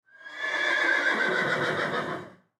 Horse Neigh Long; Animal; Horse Sound Effects

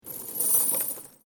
Chain Metal Rattle Short

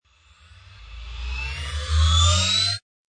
Short Synth Rise, Rising sound for sound design; Rises and Dives (Sound Effects:Sound Design)

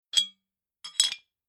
Bar Wine Glass Liquor Decanter Open And Closed; Drink Mixing Sound Effects

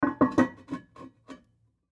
Toilet Lid On, Toilet Lid Place On Top; Bathroom; Household; Blastwave

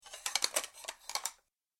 Medium Side Plates Shuffled Together Sounds

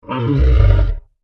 Dinosaur Groan, Creature; Animal; Dinosaurs (Sound Effects:Animals)

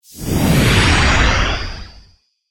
Whoosh Wipe Warble; Whoosh, Transition, Reverse, Suction, Metallic, Production, Element, Imaging, Accent, Transition; BlastWave

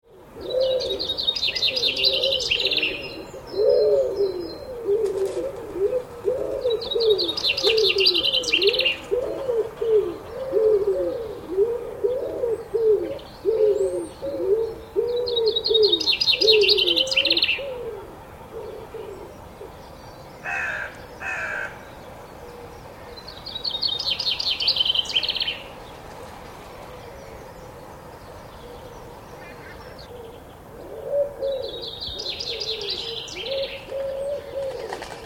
Birds Early Morning Featuring Pigeons; Bird Sound Effects

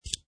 USB Retract; Sliding retractable USB thumbstick back in; Computers (Sound Effects:Science Fiction)

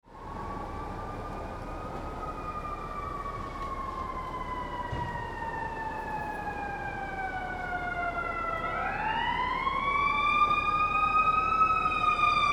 Sirens-Emergency Vehicles Respond, City; Emergency Vehicles Approach From Blocks Away

